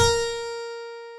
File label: lyra; theodyssey